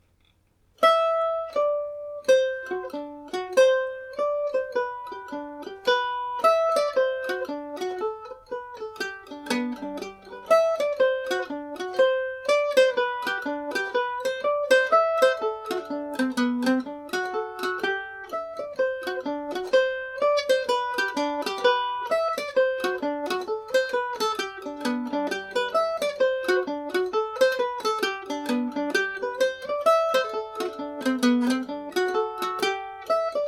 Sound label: hornpipe, mandolin; carousel; G&O #34 mandolin